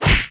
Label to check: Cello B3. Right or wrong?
Wrong